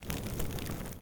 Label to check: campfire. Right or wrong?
Right